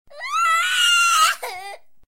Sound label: cry